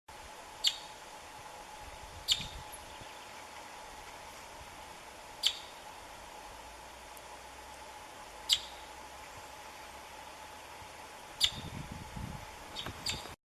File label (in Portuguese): Canto do irataua grande